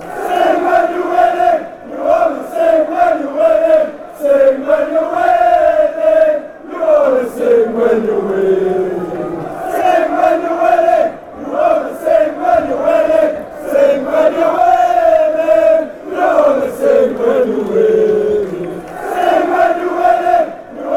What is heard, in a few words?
sing when win fanchants